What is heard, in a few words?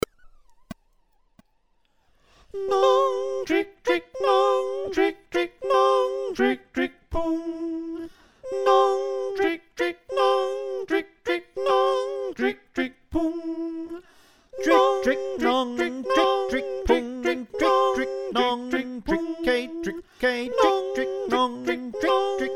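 soprano alto tenor bass, choir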